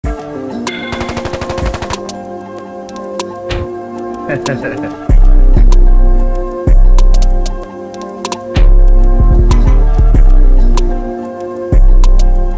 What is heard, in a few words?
instrumental